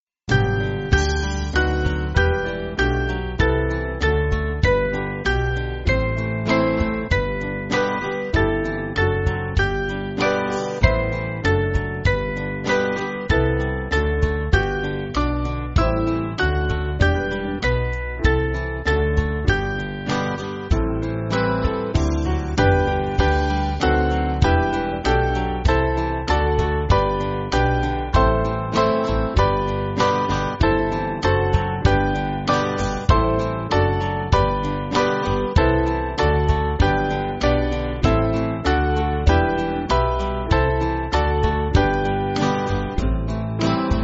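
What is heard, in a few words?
Band, church backing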